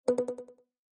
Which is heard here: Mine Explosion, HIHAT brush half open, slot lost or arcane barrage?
slot lost